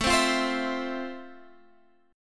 F Major 7th A

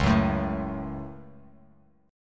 Db Suspended 4th Sharp 5th, Bass Standard; strummed

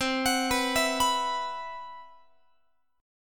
C Major 7th Flat 5th C, Guitar; CM7b5 arpeggiated

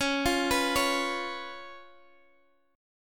C sharp 7th C sharp, Guitar; C#7; arpeggiated; tuning=4E,4A,5D,5G,5C,6F; guitar chord

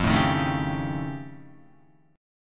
F Augmented 9th F Guitar, chord; strummed

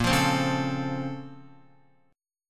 A sharp Minor Major 9th, down, Guitar; A#mM9 strummed; JGuitar

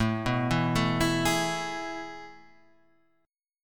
A Major 7th Suspended 2nd, Guitar, Half Step Down; AM7sus2

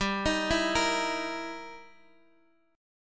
E Minor Major 7th Flat 5th G

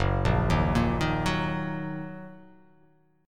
Gb 6th Gb down; Gb6 arpeggiated; JGuitar

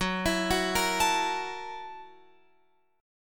F sharp 7th Sharp 9th, Guitar, Open F; F#7#9 arpeggiated

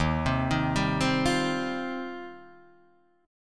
Eb 6th Add 9th, Guitar, Open D

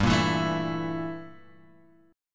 G Minor 6th Add 9th G; Gm6add9 Chord {3 1 2 2 X 0} strummed

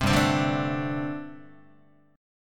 G Suspended 2nd Suspended 4th, Guitar

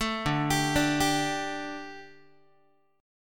D-Suspended 4th Guitar, Dsus4 chord; arpeggiated

